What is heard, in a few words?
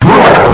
nemesis pain; cstrike